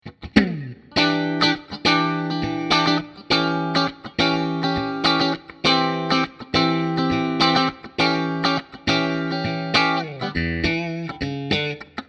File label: Clean Mid Bridge, Strat; schwarzbach; VP-Strat-IrishTour